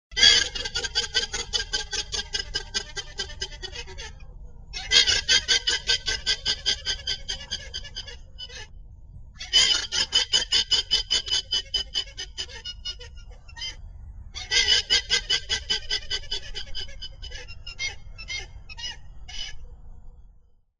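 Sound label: Llama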